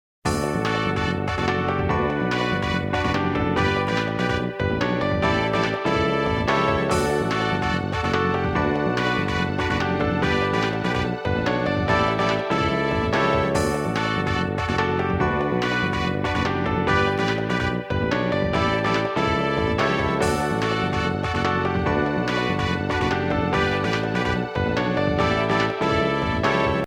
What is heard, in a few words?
unused music